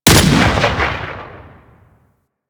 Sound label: Dual Pistol Shot 3D; Grn Queen Guard Dual Pistols